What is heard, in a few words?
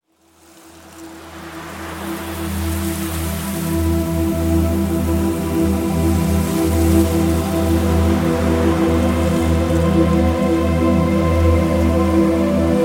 Nature Sound Relaxation & Calm Music, Peaceful Dreams